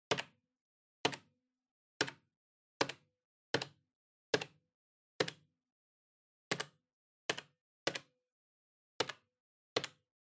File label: Calculator Single Mid